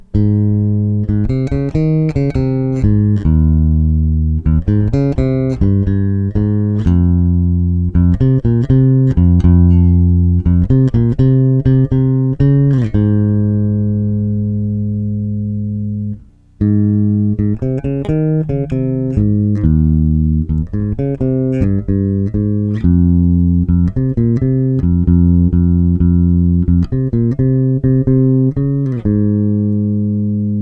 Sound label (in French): jeu au doigt, basse; cort custom